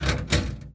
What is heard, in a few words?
Mine Door Unlock; lethal company